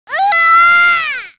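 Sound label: Vox Damage, Korean; Hanus; kingsraid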